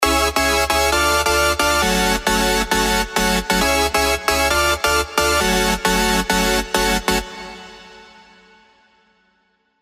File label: Corrupted, rave stabs; Spire; revealed